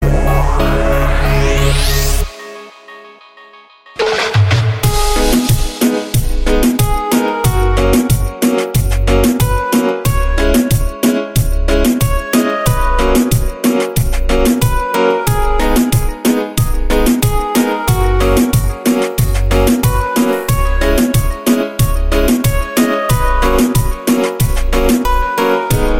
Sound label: beat